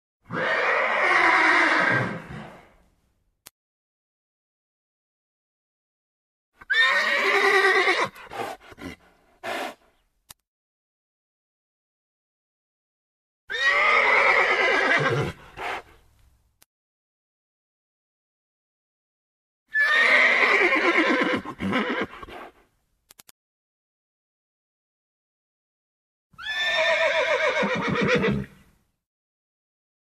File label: variations in horse rye